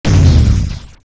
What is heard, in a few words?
Default Error